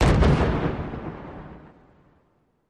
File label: 2x1 Pound Pipe Bombs